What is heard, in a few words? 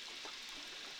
Background Noise Clips